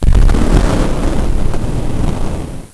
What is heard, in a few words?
explo Big; chromium-bsu